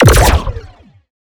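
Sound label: Sci weapon Shot Medium Laser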